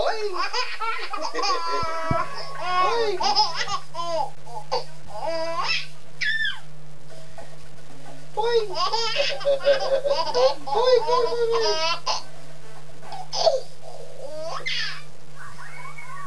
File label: laugh; Laughing 7 mos.